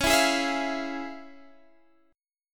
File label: F Minor Major 7th Sharp 5th, C sharp